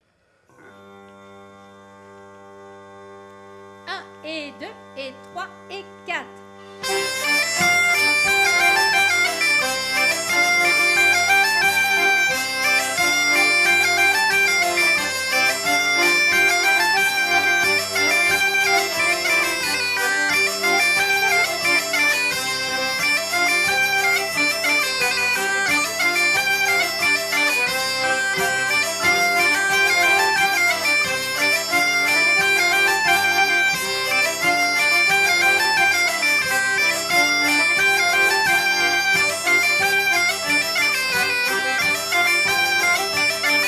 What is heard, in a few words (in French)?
Scottish Instrumental